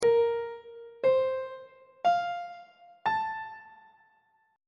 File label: a sharp major 7th suspended 2nd chord intervals on treble clef